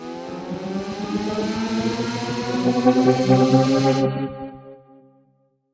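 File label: Modulation Proc, alarm siren samples, 120bpm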